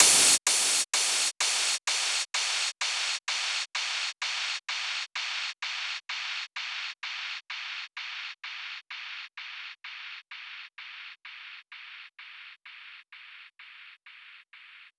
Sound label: FX Downlifter